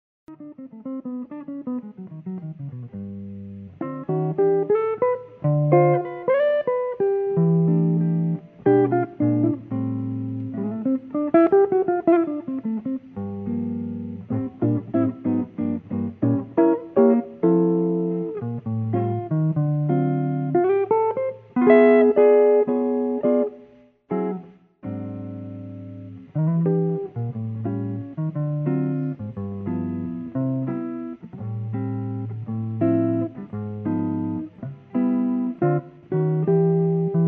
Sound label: solo